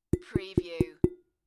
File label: Tapping on a Glass Lampshade; beanstalk audio